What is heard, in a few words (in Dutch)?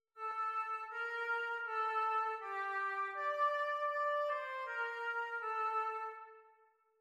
Choral Melodie; Bach; Ach Gott vom Himmel